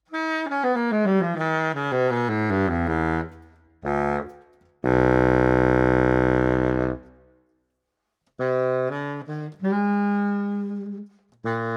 BARITONO DIRITTO, sax; timbri

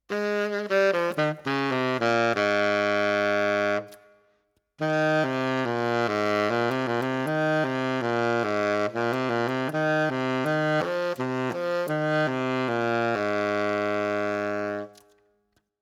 ROTHFONO BARITONO, sax; timbri

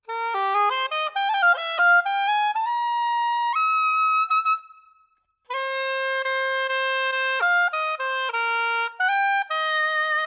SOPRANINO DIRITTO; timbri

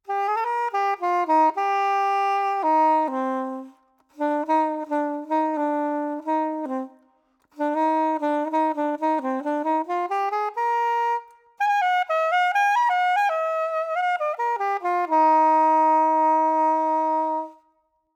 SOPRANO CURVO COLLO DIRITTO; timbri

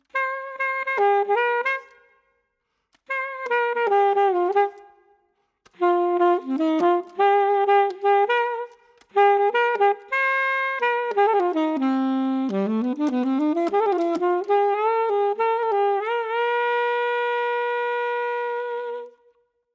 VIBRATO ALTO, sax; timbri

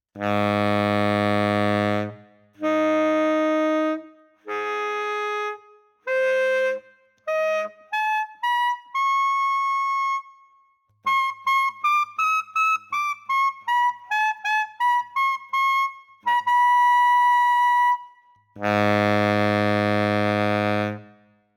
TENORE; SIGURD RASCHER

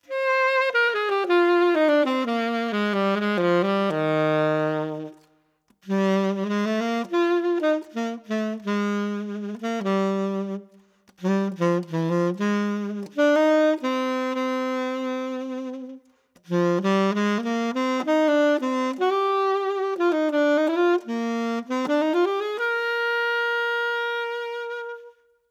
TENORE C MELODY, sax; timbri

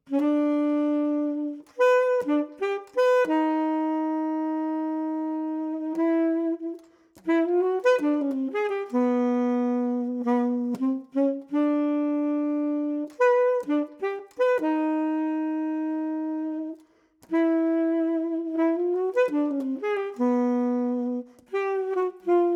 TENORE DIRITTO, sax; timbri

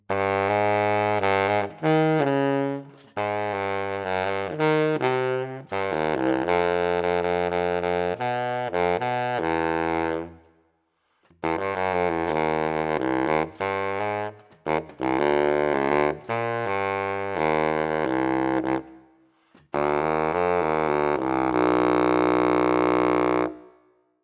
BASSO, timbri

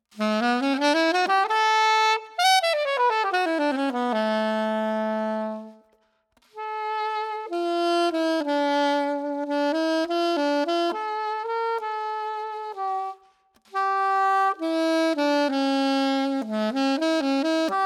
MEZZOSOPRANO; timbri

